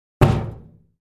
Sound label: Cabinet Door Knock